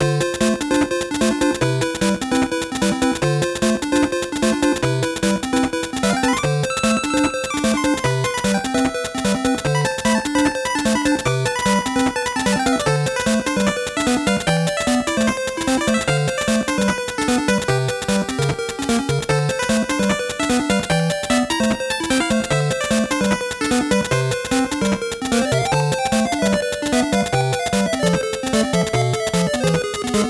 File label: Staff Roll; GBC